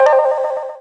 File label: Buzzer; CarmenSandiego; Buzz-in system